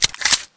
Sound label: g18 clip out